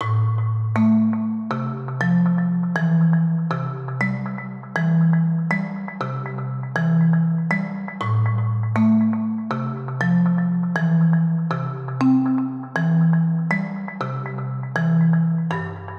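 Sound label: 120 A minor instrument loop marimba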